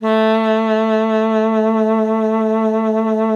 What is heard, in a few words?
A3, ALTOSAX; Xsample VOL-2; 90 s Sample CDs